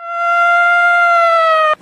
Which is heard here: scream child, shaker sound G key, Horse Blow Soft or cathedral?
scream child